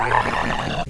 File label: pit drone alert, zombie